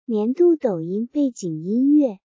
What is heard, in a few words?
chinese tik tok music bgm; douyin niandu